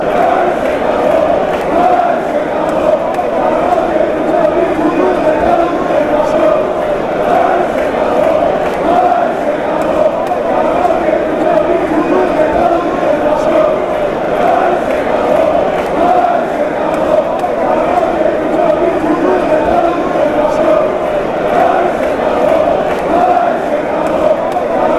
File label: chant; panathinaikos